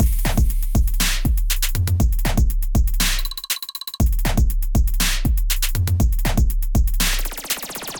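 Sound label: Electrified Kit, Drums; Ableton; Bomblastic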